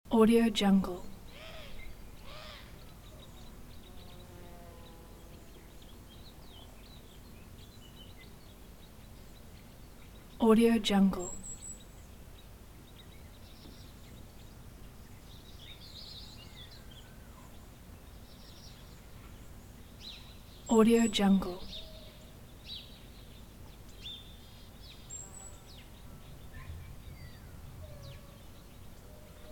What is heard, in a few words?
quiet late summer countryside ambience loop; آمبیانس سکوت روستا; nature sounds